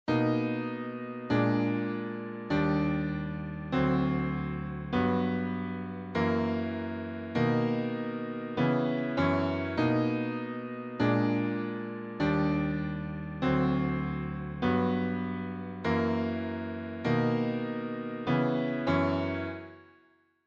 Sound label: Piano